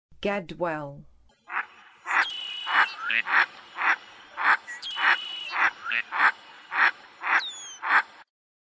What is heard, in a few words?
Gadwall, Songs of Birds of North America